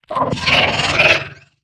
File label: Attack